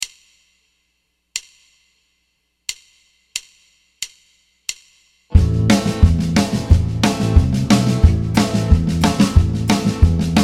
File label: Guitar Vamp Am